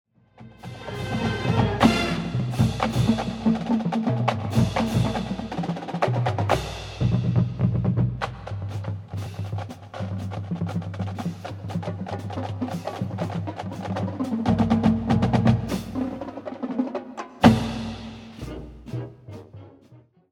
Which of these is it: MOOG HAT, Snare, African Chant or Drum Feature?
Drum Feature